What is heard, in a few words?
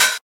smooth hi hat g key, Royality free hihat tuned to the G note. Loudest frequency: 4671Hz; drum single hits one shots